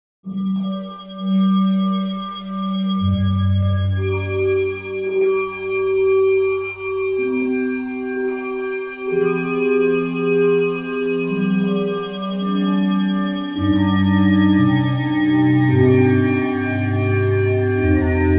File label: Tuning Fork Pad